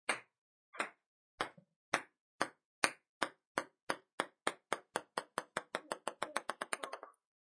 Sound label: Ping Pong Bounce, Ping pong ball bounces multiple times until still.; Sports